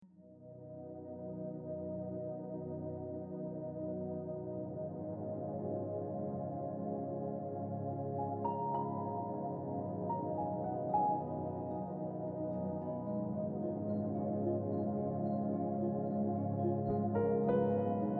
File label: orchestral